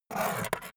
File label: scissors pickup